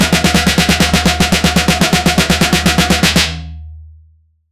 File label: groove metal snare drum, hard hit